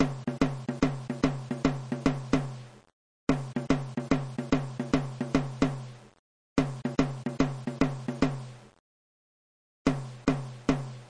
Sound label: funk mid surdo